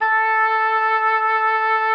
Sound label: OBOE A4; Xsample VOL-6